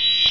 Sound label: ray